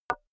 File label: wood board knock light